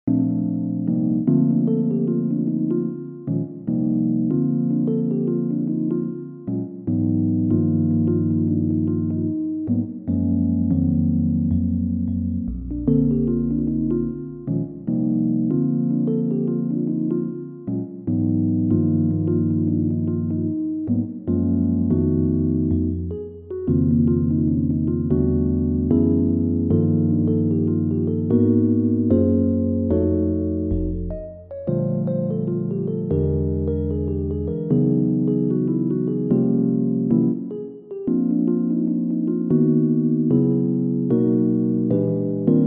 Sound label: solo piano